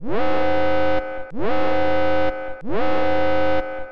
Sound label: alert; Kotor